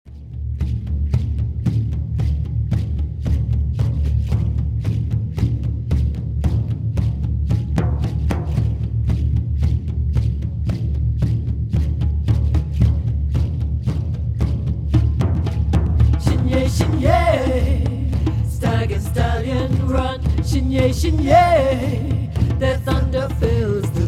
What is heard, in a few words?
Thunder drum